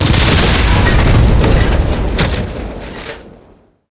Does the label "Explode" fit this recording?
Yes